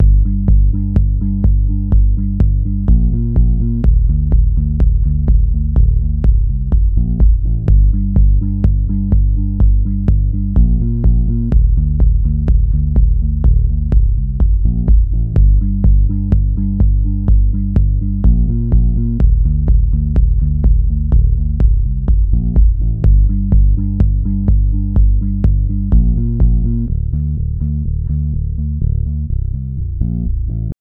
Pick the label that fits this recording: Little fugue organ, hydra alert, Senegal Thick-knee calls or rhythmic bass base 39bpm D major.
rhythmic bass base 39bpm D major